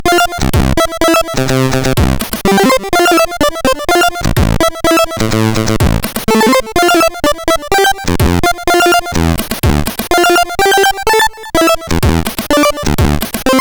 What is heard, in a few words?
Arcade, sid CSG8580R5; Commando